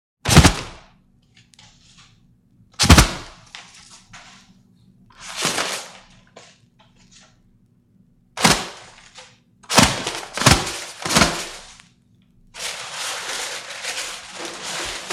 household paper towel dispenser dry hands throw away; sound effects one